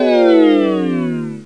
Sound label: click quitter; ECTS 1998 Ubi Soft Entertainment Press Kit